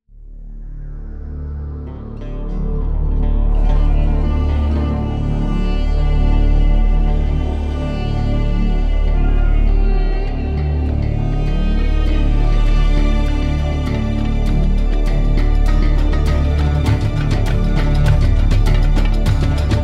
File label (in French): ambiance oasis suite